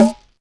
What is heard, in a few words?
Perc, Alesis DM5; Drums